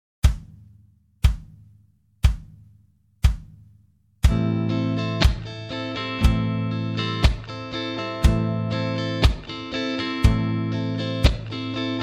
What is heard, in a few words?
Latin slow